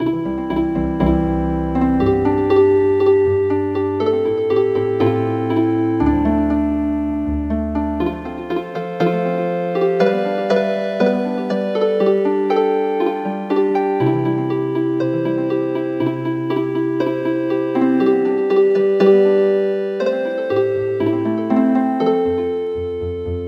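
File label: Healing Harp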